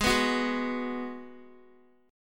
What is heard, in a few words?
G sharp Minor 7th G sharp, Guitar; G#m7 strummed; x,11,11,11,8,x